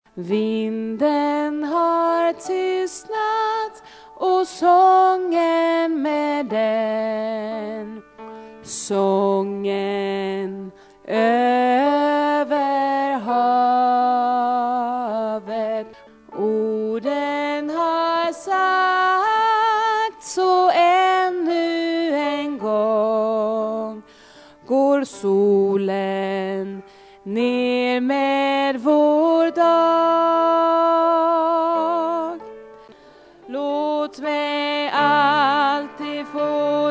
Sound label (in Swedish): bas